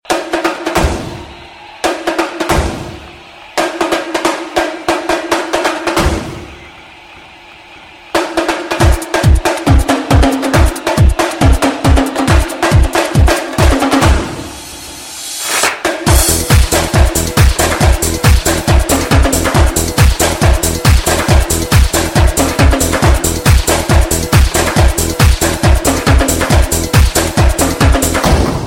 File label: drum beat